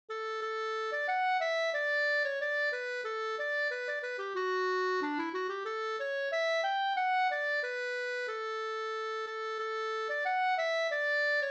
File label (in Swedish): vismelodi; Sparv Fars visa